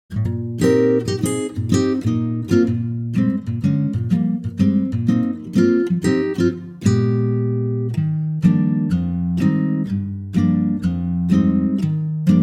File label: Eb acoustic guitar